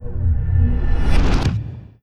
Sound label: ftl wormhole close ship; ambient; Stellaris